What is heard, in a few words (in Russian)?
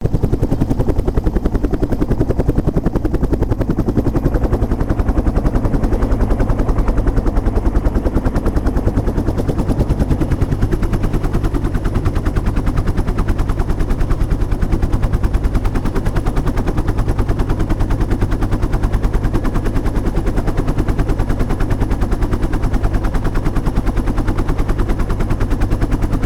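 вертолет Bell UH-1D посадка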